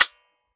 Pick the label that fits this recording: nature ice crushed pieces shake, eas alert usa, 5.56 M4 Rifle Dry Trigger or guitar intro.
5.56 M4 Rifle Dry Trigger